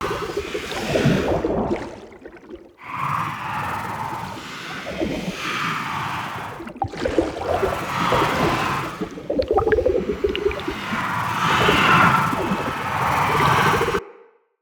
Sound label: Infested Fishing Fish Swim Loop; Weapons; Infested Fishing Spear